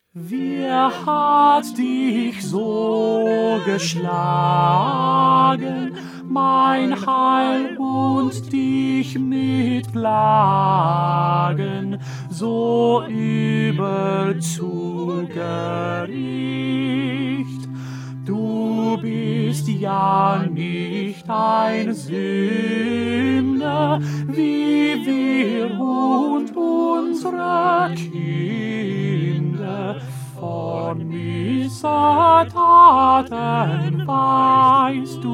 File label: Tenor; Bach; St Matthew Passion